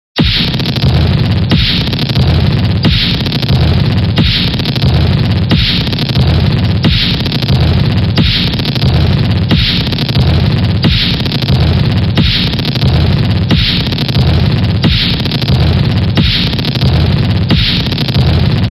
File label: Gameover